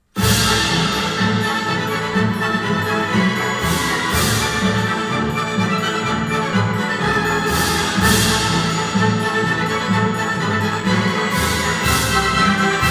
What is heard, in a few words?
24dBa